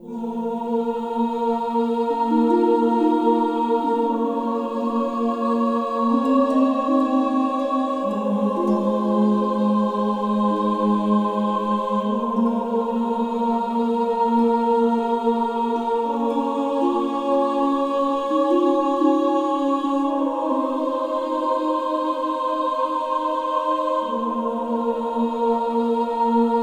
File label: Church Loop; Eternal Edge